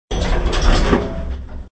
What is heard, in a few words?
Elevator Door Close; bobtherobber2